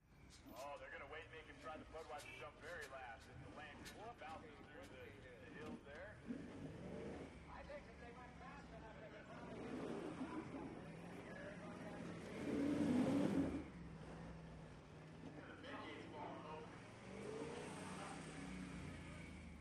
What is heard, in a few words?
Monster Trucks; General Ambience, 4x4 Pickup Truck Jumping And Racing Thru The Obstacle Course